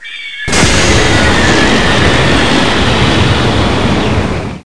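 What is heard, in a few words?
wall smash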